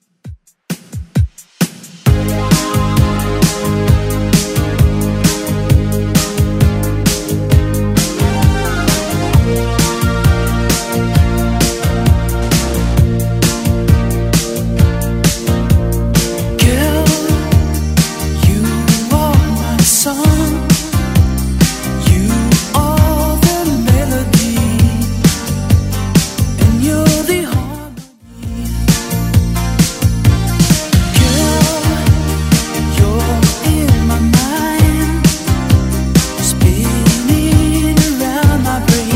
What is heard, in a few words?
80s Redrum 132bpm